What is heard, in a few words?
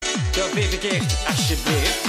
ringtone